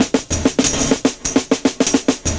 FILLS, 70_s Breakbeats

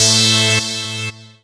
chord 1, sound chord

